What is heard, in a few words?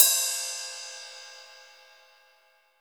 M16 R, 16 20INCH RIDE; AKAI S6000 CD-ROM - Volume 3; Ride Cymbal